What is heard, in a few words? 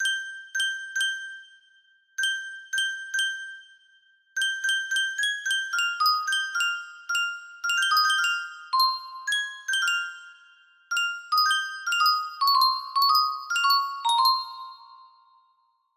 melody, music box